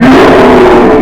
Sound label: pain; zombie